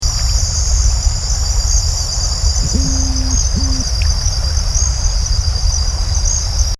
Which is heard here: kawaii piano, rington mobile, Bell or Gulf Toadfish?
Gulf Toadfish